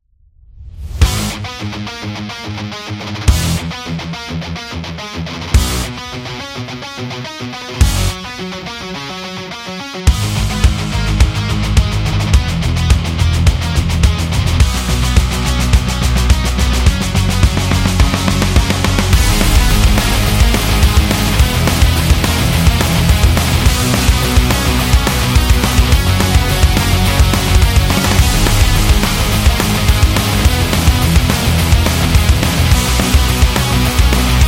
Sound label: Pop Punk